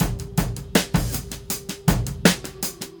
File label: Drum loops, Boombap